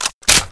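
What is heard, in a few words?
machine gun mag in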